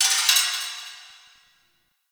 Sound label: Asteroid Close Metal Hits; Warframe; Ambience; Grineer Asteroid